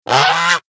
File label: quack a trice attack; mobs